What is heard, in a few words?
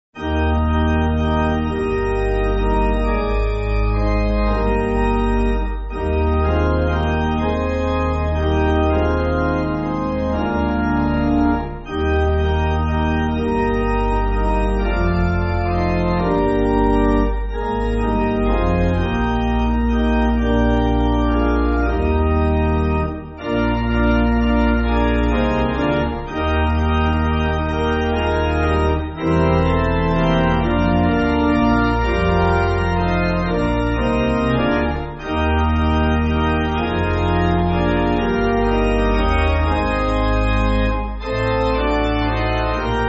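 Pipe, Music Backing Files for Churches; Marvellous Cross; McConnell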